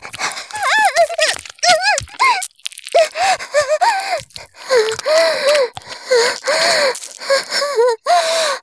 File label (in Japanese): 絶頂 中出し; 絶頂+潮吹き+中出し ランダム再生